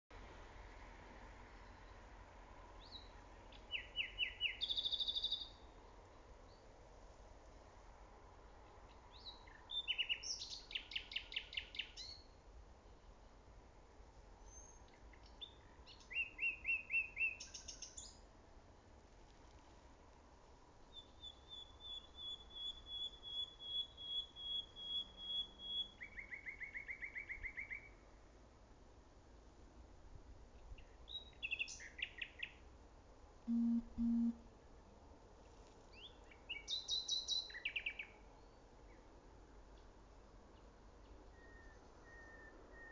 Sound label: Luscinia megarhynchos; SETE